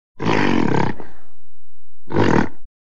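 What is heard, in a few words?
gorilla voice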